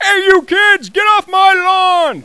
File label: BARN; mtm2demo; PC Gamer 4.2